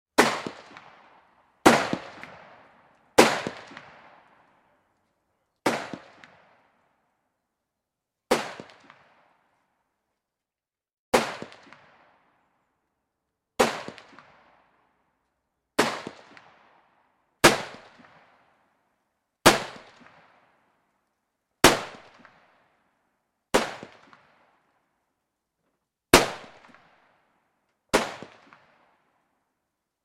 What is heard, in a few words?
Gunshots Exterior, Various pistol and rifle shots; Gun Shot Sound Effects